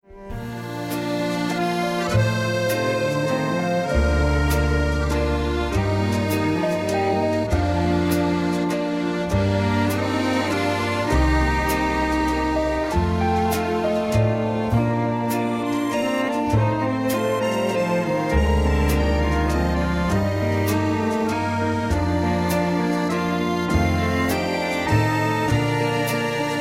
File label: Waltz, Accordion